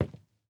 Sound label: footsteps WOOD; PC Building Simulator